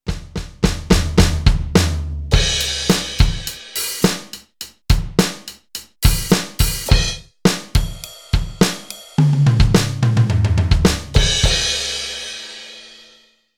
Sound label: Funk kit drum test; Donner DED-200